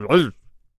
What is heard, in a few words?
Protector Attack Vocals